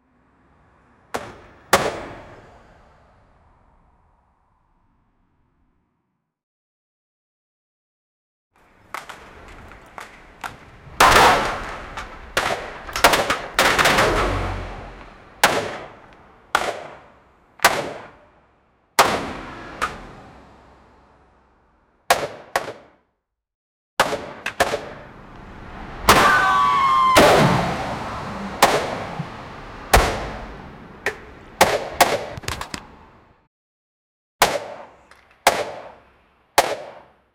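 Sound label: several people are shooting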